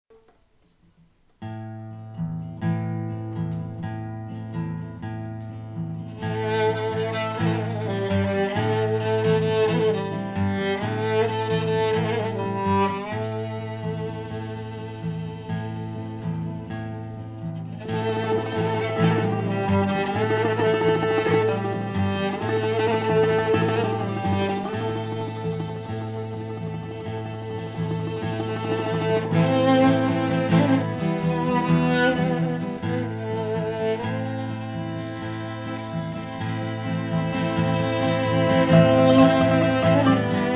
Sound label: violin